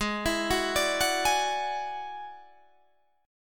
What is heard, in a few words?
F sharp 7th Suspended 2nd Sharp 5th, G sharp